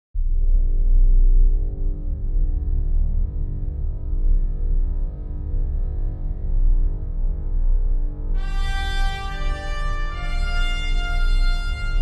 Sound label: Fanfare for Space